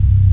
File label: Powerup; TR1; Scion